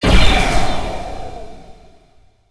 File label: fling target slow; weapons; dota; meepo